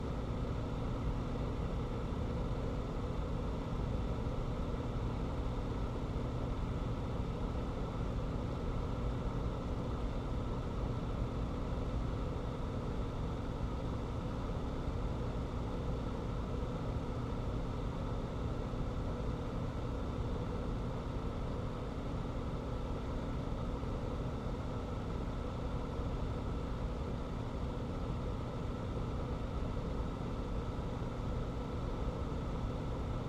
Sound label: Ventilation Fan, small box, metal, electric motor, disconnected, whirring, spinning, med high intensity; loop; Eiravaein Works; Vaeyan IV